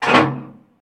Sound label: Door Close Clunk, doors metal